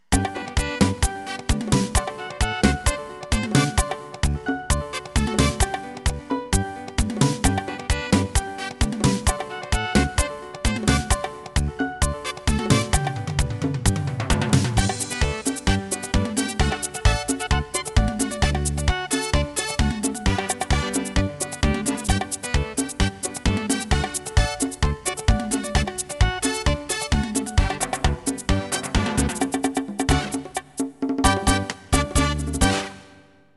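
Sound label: Merengue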